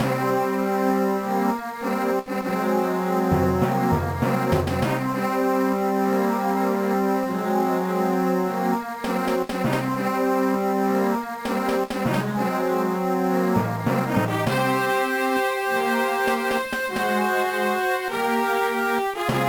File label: you lose; Metal Max 2 Kai; gba